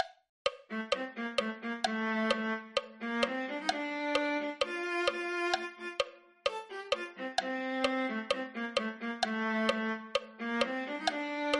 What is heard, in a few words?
viola